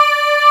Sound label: SYN AIR D4; E-MU Formula 4000 Series Vol. 3; Analog Odyssey; Synth Builder